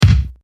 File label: kick